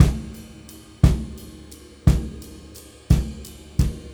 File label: Brushes